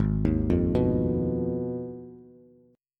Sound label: Ab Minor 6th, Ab, Bass; Abm6 arpeggiated; JGuitar